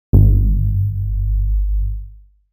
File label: Bass Cinematic Impact Hit